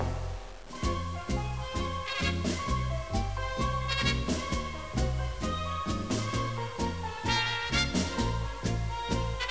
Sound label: Tango